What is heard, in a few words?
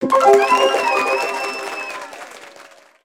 MINIGAME SUCCESS